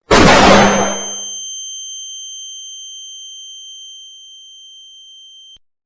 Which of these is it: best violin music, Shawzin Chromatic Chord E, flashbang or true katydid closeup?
flashbang